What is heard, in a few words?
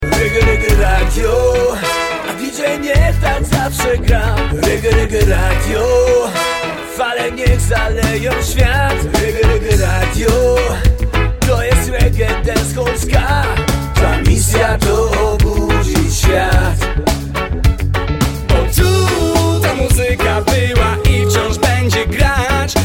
Reggae